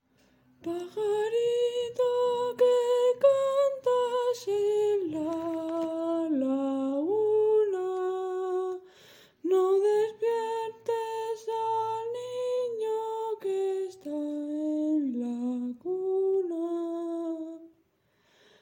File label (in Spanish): Canto